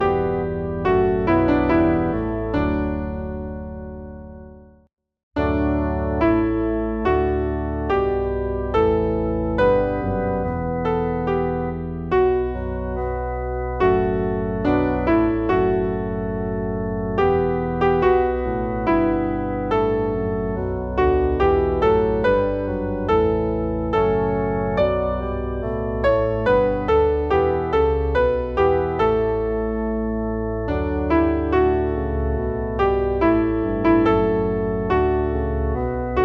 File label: piano Organ, Holy holy holy (Sanctus); Holy Eucharist I